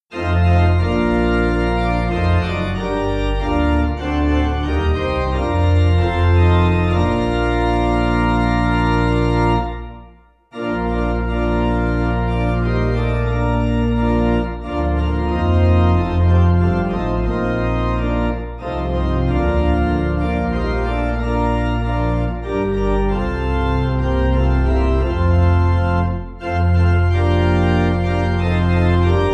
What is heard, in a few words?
Praise The Lord Pipe; Faben